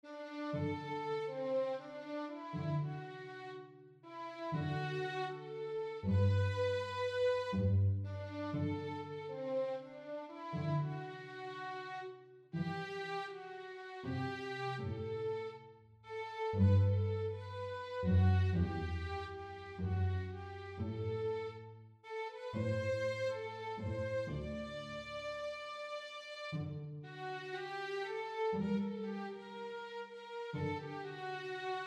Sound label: melody A intro